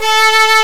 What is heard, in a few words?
Flute